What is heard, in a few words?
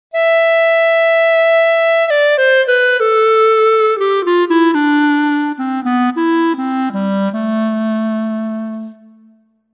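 Classical Clarinet; TURBO VL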